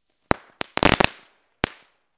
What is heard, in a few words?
Firecrackers